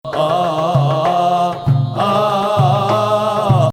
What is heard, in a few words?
2nd Ajam, maqam